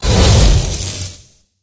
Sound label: lasergem created